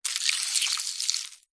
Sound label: nature ice slushy pieces movement; zapsplat